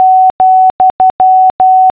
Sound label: comma, morse; The Numbers Racket CD-ROM